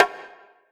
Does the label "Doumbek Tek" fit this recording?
Yes